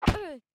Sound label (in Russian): Звук удара карты о карту